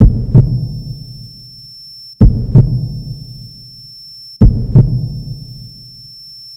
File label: Parasite Infection Beat Ring